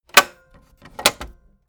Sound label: tape deck close clatter